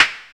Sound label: FX analog; Sound & Vision - Gigapack II